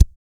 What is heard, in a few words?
BEATERBOY; Spectrasonics Vocal Planet